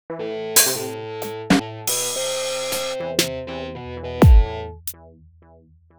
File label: Rock